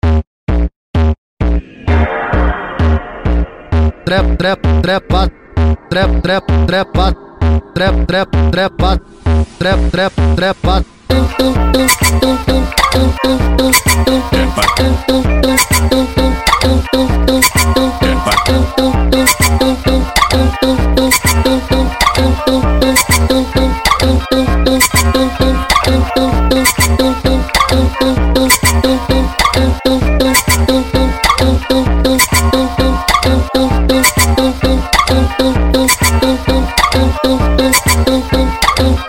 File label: FUNK